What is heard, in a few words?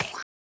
Walking - Wet; Ed Edd n Eddy; Adromulus Pack; EEnE